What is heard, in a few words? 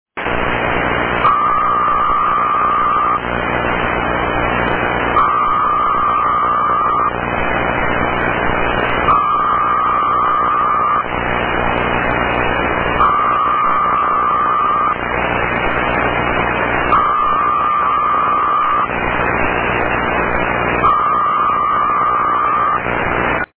Daily UNID 3235kHz